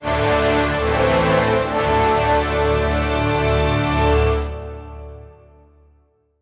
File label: Board Music Stinger